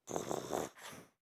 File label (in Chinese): 狐狸睡觉声